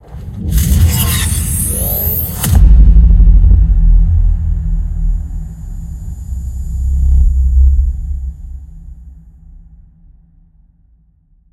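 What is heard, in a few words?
cinematic risers; Sonniss GDC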